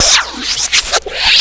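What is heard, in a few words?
scratch, record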